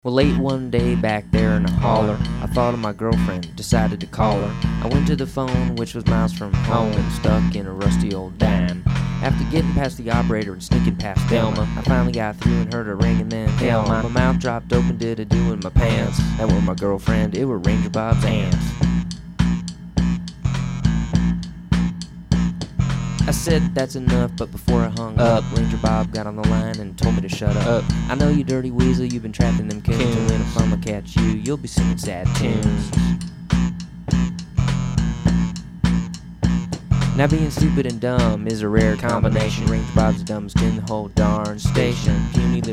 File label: hick rap